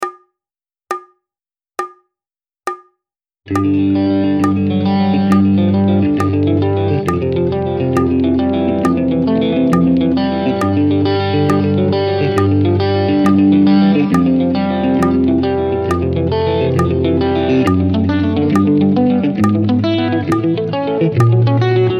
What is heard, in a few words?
Crosspicking Etude 68 bpm, guitar